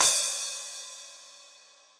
Splash 12 Session Dry, Cymbal; Drum Hits; Standard Hits; Ableton; Factory Packs; Session Drums Studio

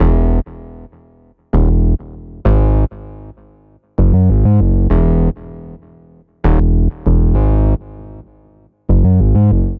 Bass Ab Major 90bpm; MIDI Clips; Bassline; Hacksaw; Ableton; Factory Packs; Chop and Swing

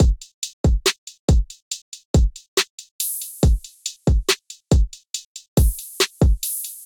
Kit, Trap Drums; Quasar Kit; Ableton; Factory Packs; Trap Drums by Sound Oracle

